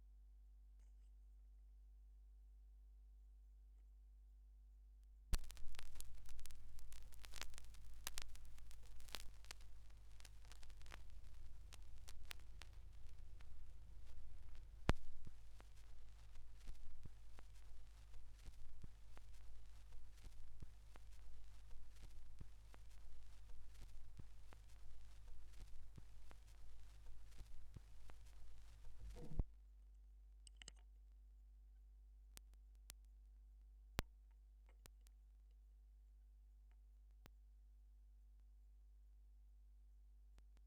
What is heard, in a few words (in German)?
schallplattengeraeusche; rauschpartikel